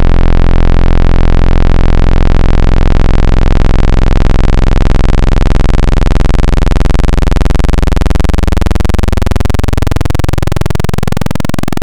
Analog PWM Custom, wavetable

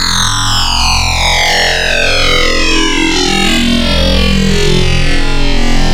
Hyper, Wavetables

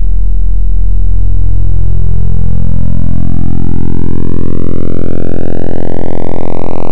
Dist Rectify, wavetable

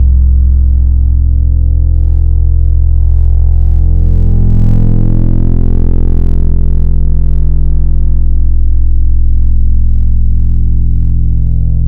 FM, Wavetables

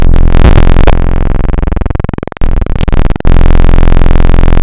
bw squ; Adventure Kid

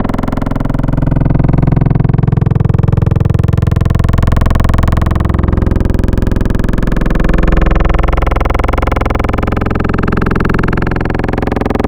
Growl, Wavetables

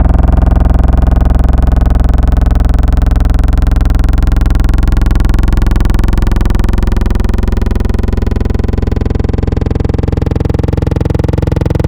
Growl, Wavetables

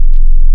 bw sin, wavetable; Adventure Kid

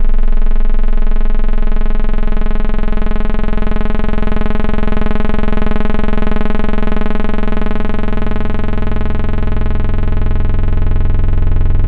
wavetable; Neu KatalYst

